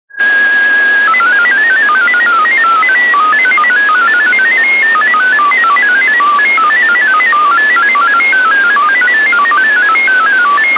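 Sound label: MFSK, spacesounds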